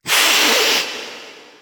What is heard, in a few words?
Cat Hiss Softer